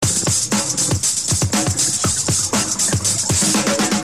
ladrum 120

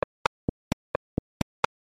130bpm drums

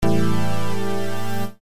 float synth

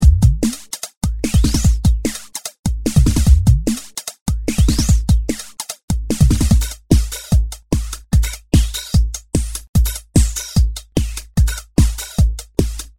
beats

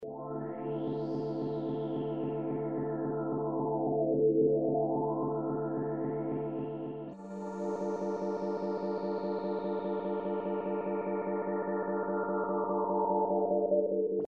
loop string

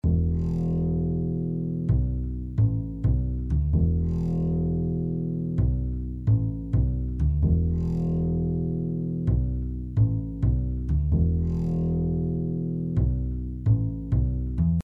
bass

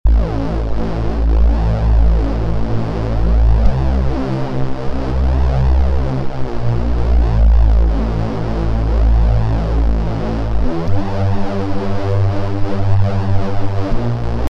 bass pad

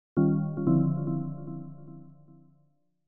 e piano

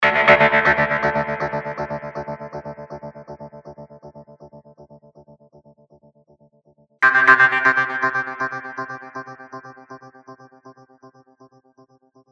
powerchrd

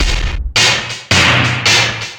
beat 4 lofi

